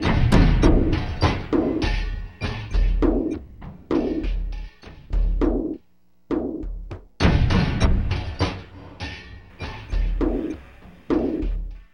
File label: Elektro Frame Slow, Drums; Sonic Foundry (Sony Creative Software); Friendly Fire